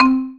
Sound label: Marimba C3; PX7; Digital Sound Factory Protean Drums